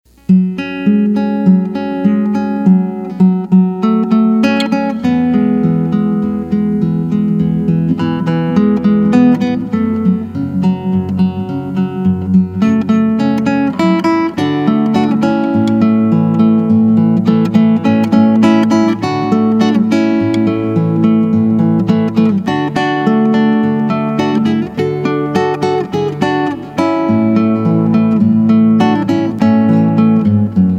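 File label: Solo, Guitar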